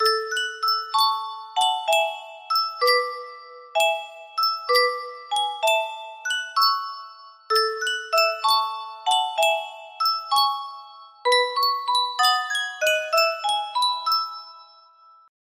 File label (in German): Yunsheng Spieluhr